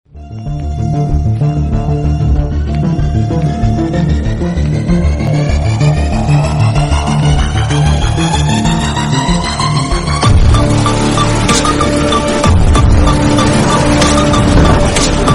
movie bgm telugu movie bgm ringtone; Evaru

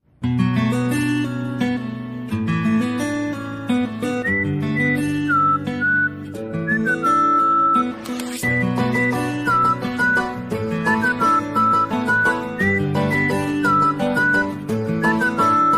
romantic ringtone; madam sir

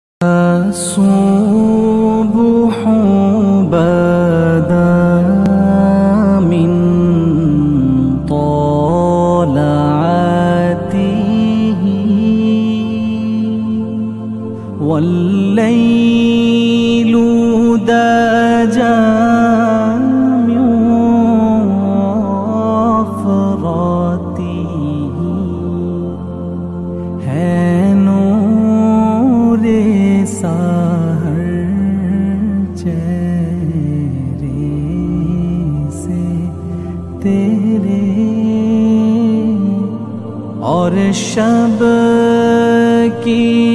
slowed reverb islamic morning ringtone

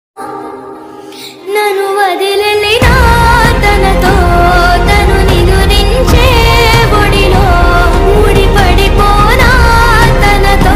sad bgm sad love bgm ringtones; backbenchers

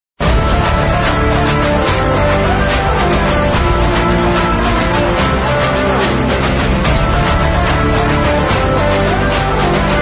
bgm ringtone; ugram

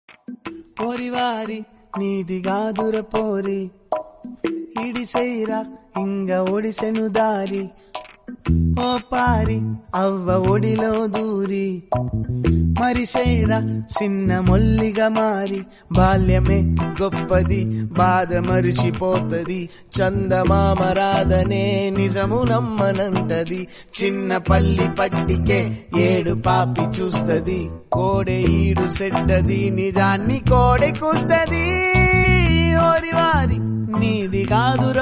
song ringtone; dasara

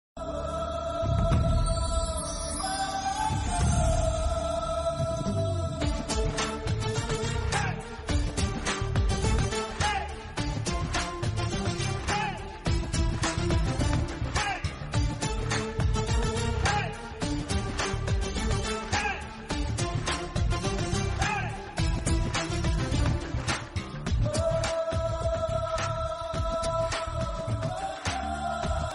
bgm movie love ringtone music ringtone; balu; pawan kalyan

